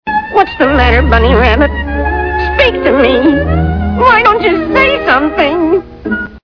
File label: gremlin